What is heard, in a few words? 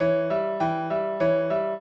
trio, piano; Mozart